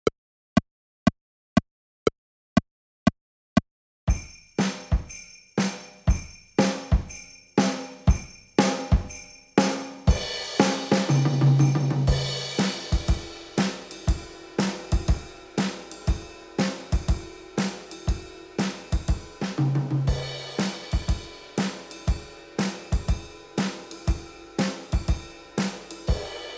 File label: jolly old violas perc only mix; orchestra